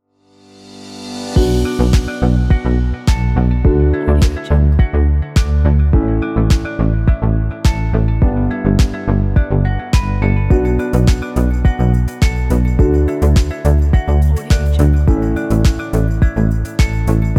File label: corporate and inspire